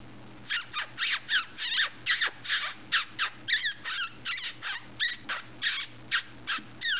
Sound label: Lip Squeak